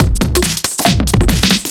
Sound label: Duo Swing Mix B 140; off the grid